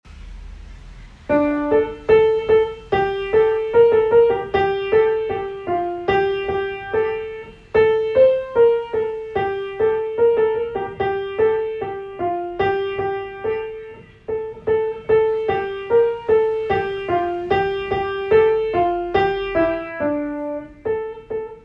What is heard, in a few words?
piano; citysings